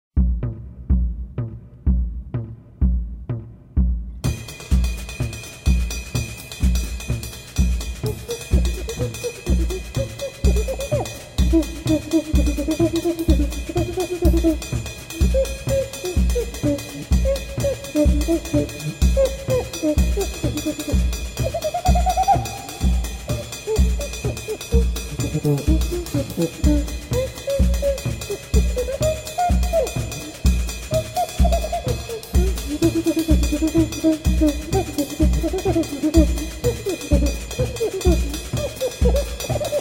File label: cuica solo